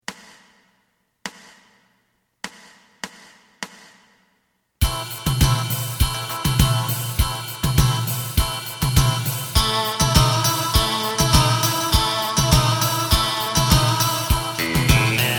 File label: bass; エレキバンド用カラオケ